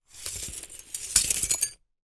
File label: foley glass broken picture slide shards movement